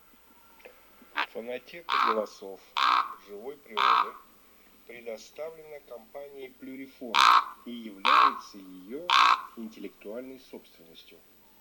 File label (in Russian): Ворон Corvo imperiale; plurifon